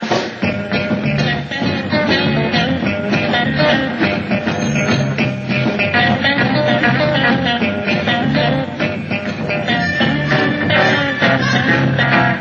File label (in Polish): Sygnał zespołu; Prezentacja